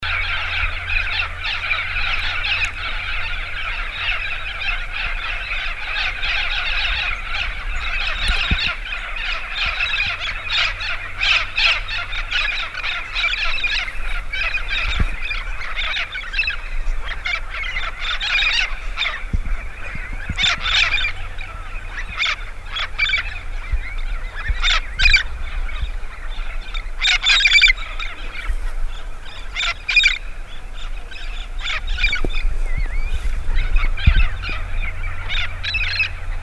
red spectacled parrot

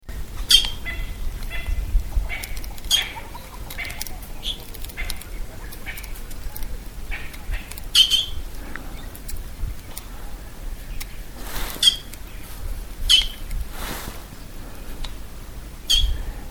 rueppells parrot

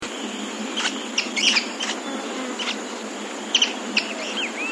swallow tailed hummingbird

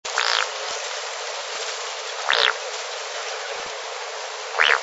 croaking ground dove

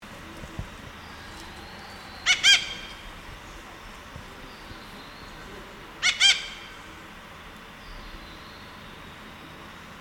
red breasted parakeet

